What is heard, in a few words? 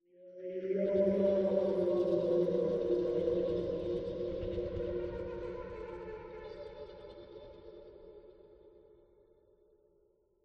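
random laughs; xen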